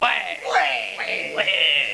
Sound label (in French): Katrwouais, le cri de satisfaction de base; Alors ca c'est le cri de satisfaction de base de la bande des p'tits mons